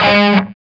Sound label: SOLDANO ROCK, Guitars